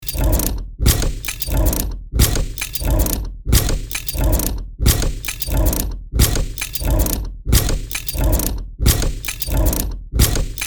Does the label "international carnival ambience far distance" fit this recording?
No